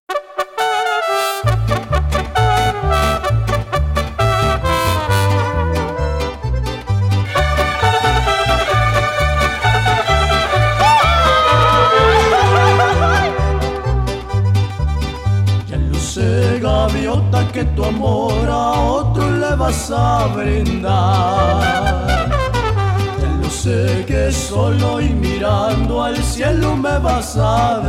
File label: mariachi; Germany Mariachi; Dressed in the traditional charro-suits and in the well known sombreros